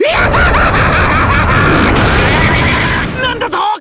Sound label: fuse fail; terumi